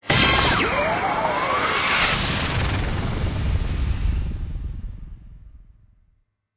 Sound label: air windy fx